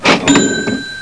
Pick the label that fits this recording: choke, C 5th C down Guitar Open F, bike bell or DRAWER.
DRAWER